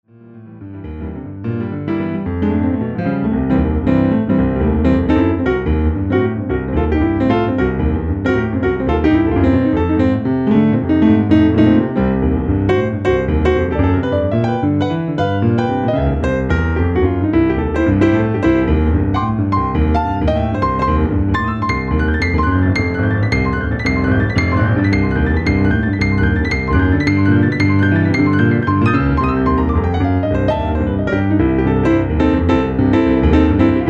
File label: piano